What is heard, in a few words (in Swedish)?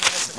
skog, skogsljud; xash3d fwgs